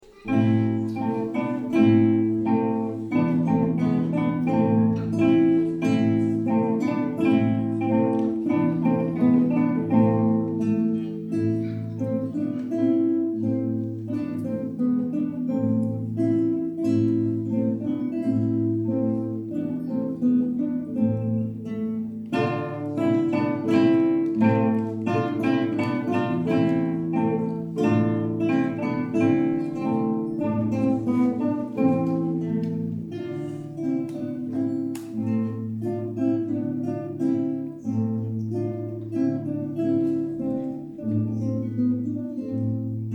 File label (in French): ensemble de guitares chanson russe; concert de noel; ensemble de guitares 1er cycle